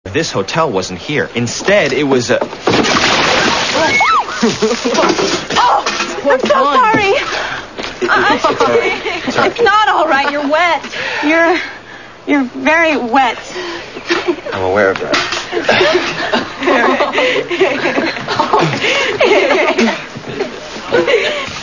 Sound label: Watersplash